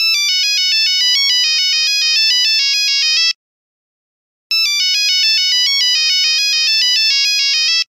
Improvisation, Nokia 3310 ringtone; Nokia Ringtones; Nokia Design Team SoundCloud

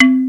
Xylo, Roland JD-990; Drum Samples

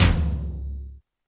Yamaha RM 50 Toms

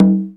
Conga, Roland R8 Percussion; Drum Samples

